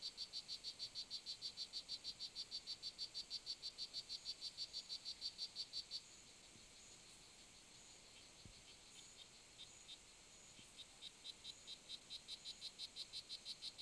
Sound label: pumilio, frogs